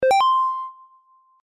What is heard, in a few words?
power up